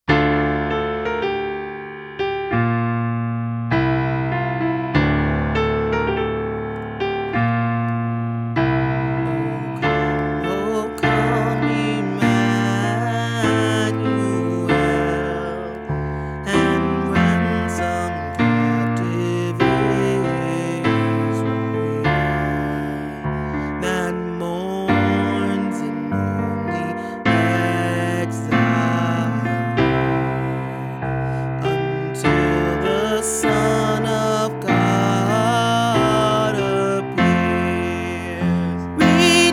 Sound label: Alto w Lead, christmas choir